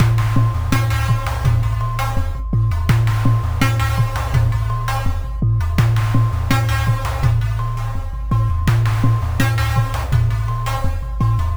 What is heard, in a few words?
Sine Wave, Processed Percussion